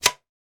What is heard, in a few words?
game frame leg slide on; connect 4